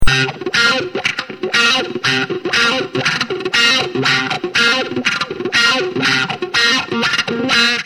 Guitar When one guitar is playing lead, the other one can play the rhythm